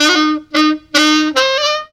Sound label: RIP RIFF, FUNKY SAX; Zero-G; Funky Elements